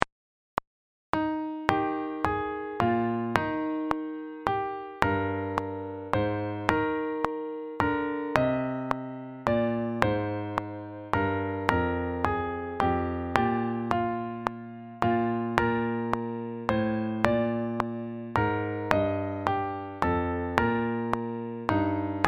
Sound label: simple bass metronome